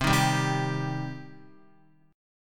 C Minor C down Guitar; Cm strummed

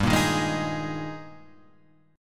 G sharp Major 7th Suspended 2nd

